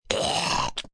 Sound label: Hork Loud, Spitting/Horking, hork, loud, no spit; Spitting Sound Effects; human voice; Spitting (Sound Effects:Human); VoiceBox; Twistedtracks